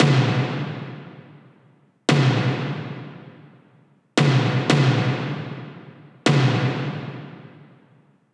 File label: Back Tom Loop 115